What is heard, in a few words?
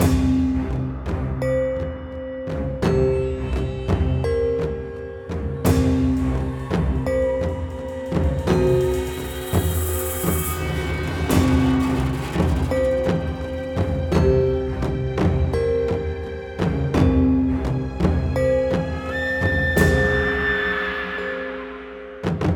ui dark; spring fes; Identity V